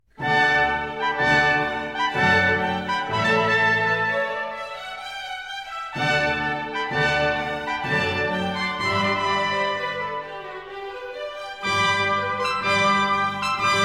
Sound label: baroque trumpets